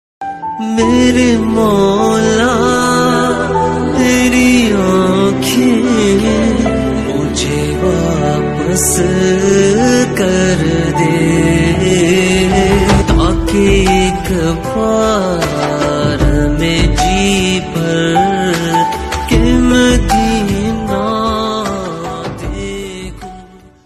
islamic ringtone

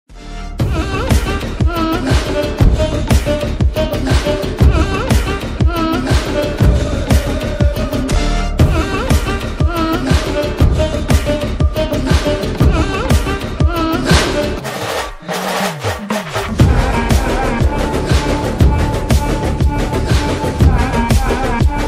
BGM tamil ringtone; Mangalavaaram

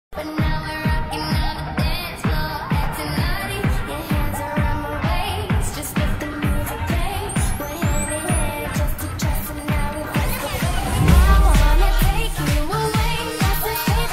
english ringtone

